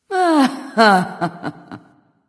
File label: "heh heh"; smite; nike